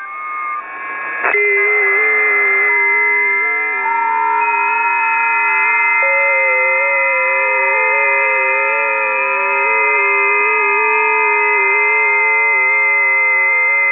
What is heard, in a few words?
20m busy, ft8; ft8 lib; rpitx